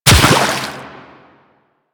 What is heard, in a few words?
Run Water, Footsteps